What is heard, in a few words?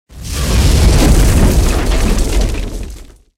tanks explosion concrete; War Thunder